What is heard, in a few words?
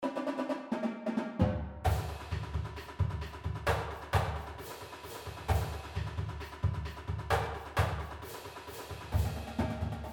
Sound Sample, PERCUSSION MUSIC